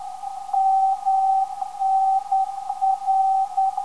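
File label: 286kHz, non-directional beacon; MNR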